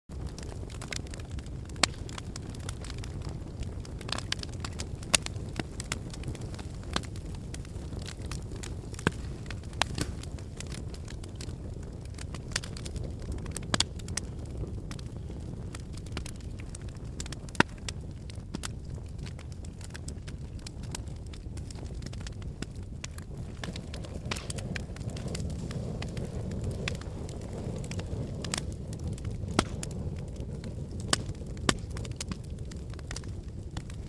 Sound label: fireplace; music